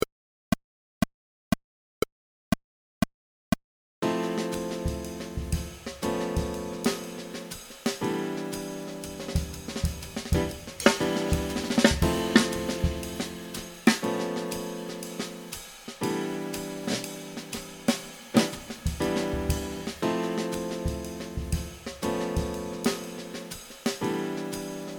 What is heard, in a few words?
Tritone Sub Medium Tempo